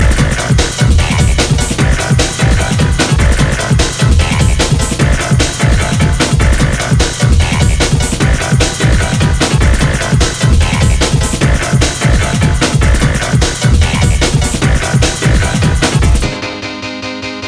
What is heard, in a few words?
Piano